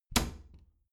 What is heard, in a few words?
bathroom cabinet door close regular